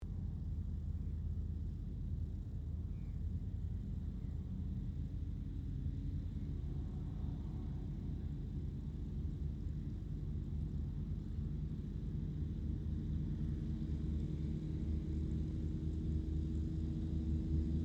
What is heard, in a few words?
AIRCRAFT DEHAVILAND DHA-3 DROVER TAKEOFF, tri engine; Airplanes (Sound Effects:Vehicles)